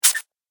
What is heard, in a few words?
Bottle Air Squeak; Airy Sound Effects